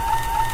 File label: Bot Roll Middle